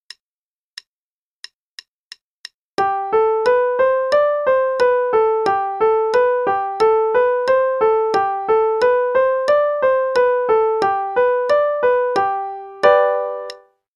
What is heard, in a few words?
G Pentascale Warm-up, qn=180; Faber; Adult Level 1 - page 91; G Pentasacle Warm-up 1